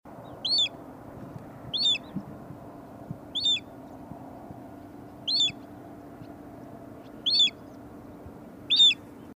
Little Ringed Plover

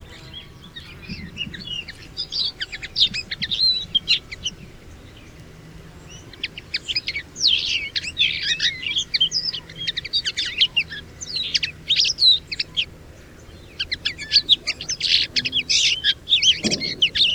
Linnet

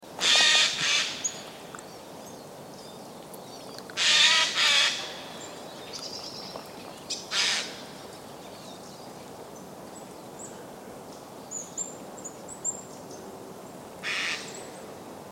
Jay

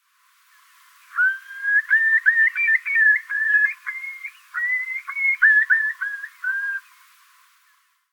Curlew

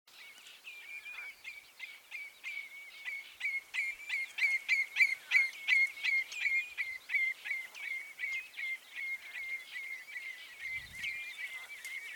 Redshank

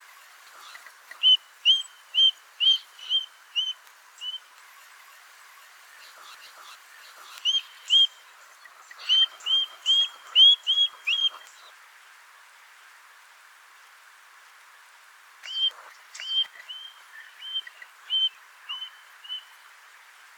Osprey

